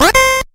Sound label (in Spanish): moneda